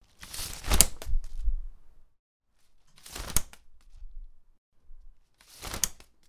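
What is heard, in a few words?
umbrella opened, umbrella closed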